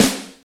Clean Acoustic Snare Sound C Key, snare single hit tuned to the C note